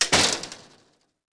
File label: SCREENDR; residential